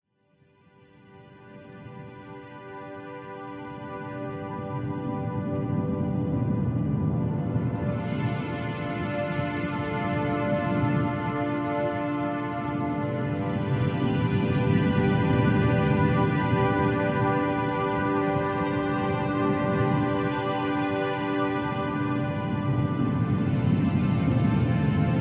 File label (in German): UW Rauschen Melodie; Unterwasser; h2o - AUDIO ist speziell für Unterwasser Video Filmer gedacht